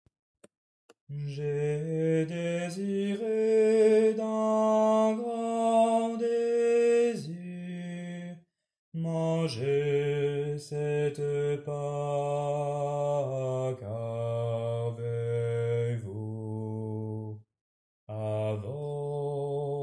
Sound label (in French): Basse; J'ai désiré